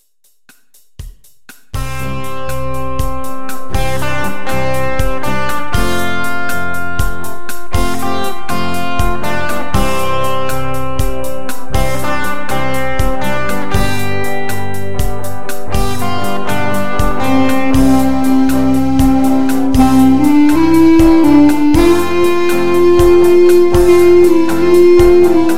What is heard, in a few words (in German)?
Taschensaxophon; Ohne Worte